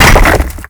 rock fall break; Call of Duty Modern Warfare

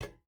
footstep boots metal jump start; Rust

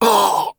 hit; Escape from Tarkov

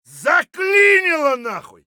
weap jammed, weap; Escape from Tarkov

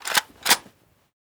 ak74u bolt action; Rust

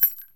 kedr round out; Escape from Tarkov

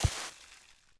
Foot Sand; Civ4 warlords

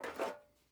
pickup metal sheet; Rust

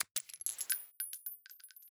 python eject shells; Rust

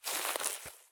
turn grass low; Escape from Tarkov

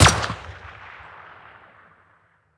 attack silenced; Rust

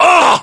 pain, voiceovers

